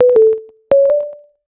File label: chat notification short